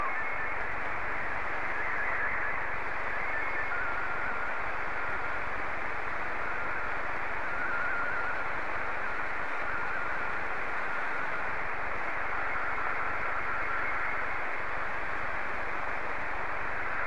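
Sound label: SSTV, Martin 2; UA9YAD; VK4MSL SSTV RX @ QG62ln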